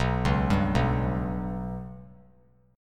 B Suspended 2nd Sharp 5th; Bsus2#5 arpeggiated; JGuitar

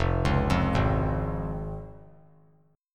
F Minor Sharp 5th, chord; Fm#5 arpeggiated; JGuitar

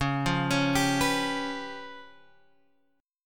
C sharp 7th Flat 5th C sharp down Guitar Open G

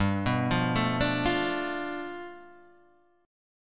G 9th, Guitar Open D

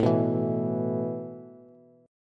A Suspended 2nd, Bass; Asus2; strummed; x,12,9,9; JGuitar

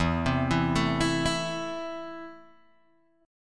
E Suspended 4th Sharp 5th, Guitar Open G

